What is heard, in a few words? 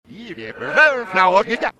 forever baby reverse